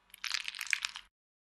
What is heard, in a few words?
organ, surgery; Shitmed; Medical